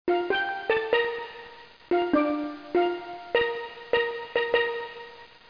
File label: Anthem